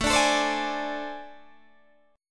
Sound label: A Minor Major 7th Double Flat 5th A, Guitar, DADGAD